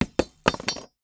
Industry Machete Hitting Solid Wood

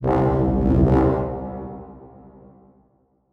Title Transition Blurp; Production Element Title Transition

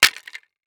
Impact Wood Hits Concrete; Impacts

